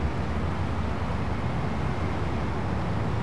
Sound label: CTY AMB; PC Gamer IT CD 49; UrbanChaos